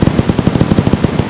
Lava Fountain; Playable Tutorial Level; TR1

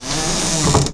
Double Doors Creak; Playable Tutorial Level

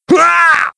Gladiator Death; Playable Tutorial Level

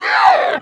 Siren Death; Playable Tutorial Level

